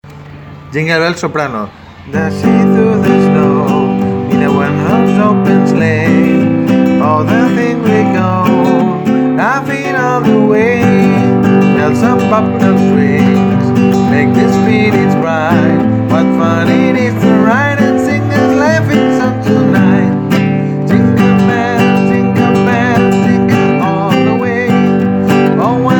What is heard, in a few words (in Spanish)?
jingle bells voz soprano